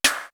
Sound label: FACESLAP; East West; Global Sounds; D4 FXS